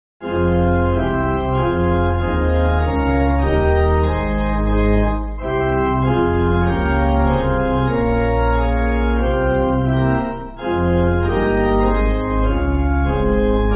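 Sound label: Let Us Behold, pipe organ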